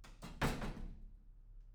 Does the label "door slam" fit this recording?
Yes